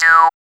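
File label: synth tone; E-Lab; X Static Goldmine 1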